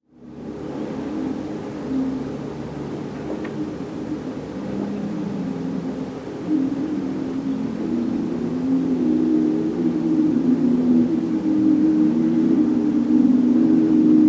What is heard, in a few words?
wind song